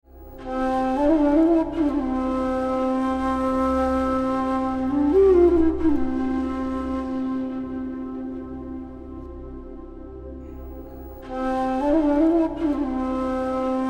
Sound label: Pushp Fulmala Music; Poojan Vidhi Tracks Marathi